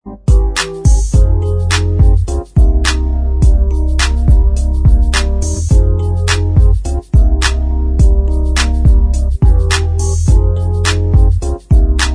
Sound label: Inst